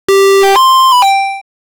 sound effect that plays, closure

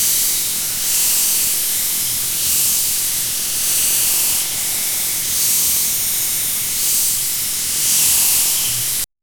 sprinkle

